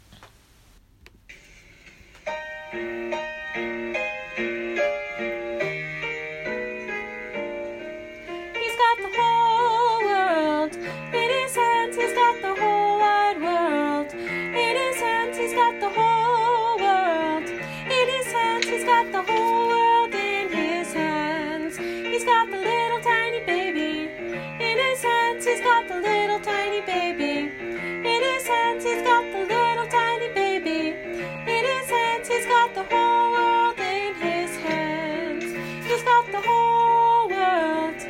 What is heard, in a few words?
whole world w vocals